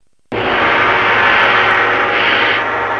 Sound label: SMS